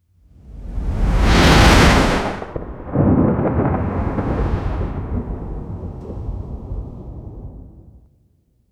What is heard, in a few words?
epic villain sfx cosmic